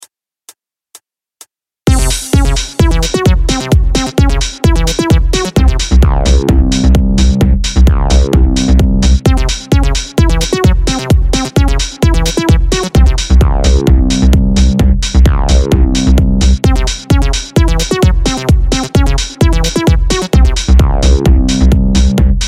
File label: goa trance